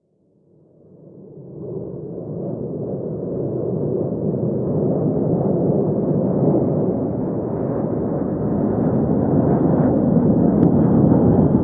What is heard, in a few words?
jet flyover int; amb elements; modern warfare 2